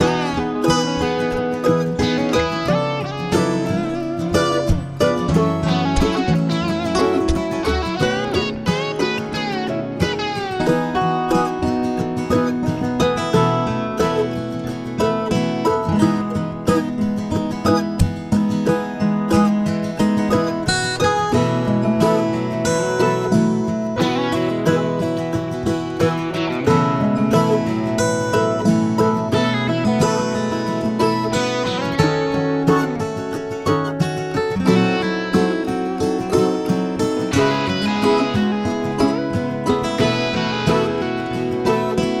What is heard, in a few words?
Gitara vibrofon